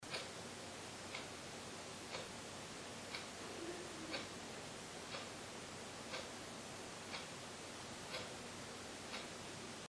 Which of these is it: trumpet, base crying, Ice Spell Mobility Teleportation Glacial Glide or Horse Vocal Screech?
base crying